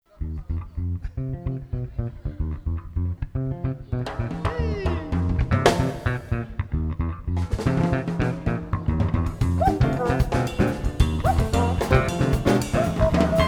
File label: Brazilian Jazz Samba